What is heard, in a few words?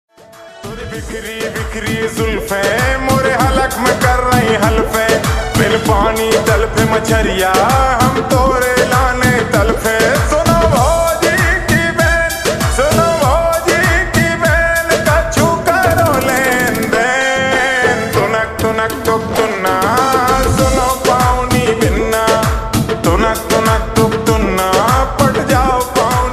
Slowed Reverb Ringtone